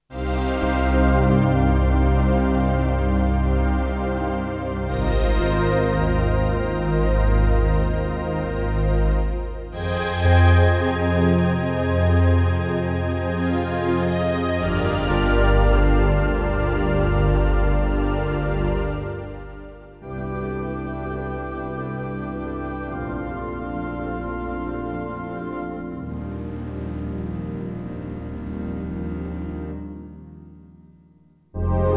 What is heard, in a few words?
pads